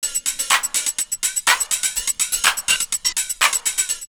Spirals 124, drumloops Rave; X-Static Goldmine; 90 s Sample CDs

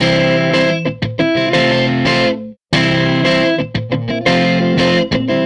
Tele Guitar Loop

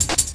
Pickup, Drums & Percussion; Percussion Fills; FX; Sonic Foundry (Sony Creative Software); Futurist Drum & Bass

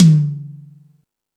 Rock N Roll, E-MU Pro-Cussion

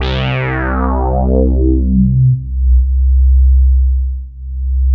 Korg POLY800 C2; Back In Time Records; Korg Universe VOL-1

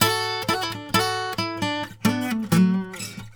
Blues Guitar; ILIO; Fretworks; LICK

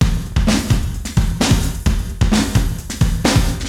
Beat (130BPM), Gated Reverb; musicradar

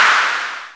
PRC CLAP; Roland L-CDX-01

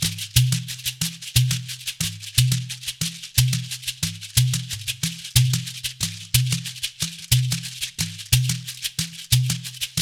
Shekere; Spectrasonics - Supreme Beats - World Dance

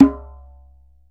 DJEMBE, African Perc

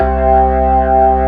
Organ B3 Hammond, C2; Best Service ProSamples vol.55; Retro Sampler

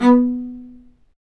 Low Marcato; Roland L-CD702; Combos 1

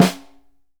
SNR, Synclavier Percussion 2 - World & Orchestral

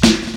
SD, HIP, Dance Mega Drums

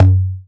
Dance Drums

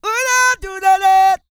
SING, World Voices, CARIBBEAN

